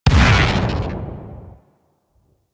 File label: Shotgun Alt Proj Mini Explosion; Weapons; Revenant; Warframe; Tenno